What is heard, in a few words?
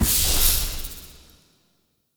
Heal; Powers; Banneret